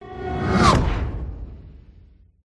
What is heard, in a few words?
whoosh; Audio Mangler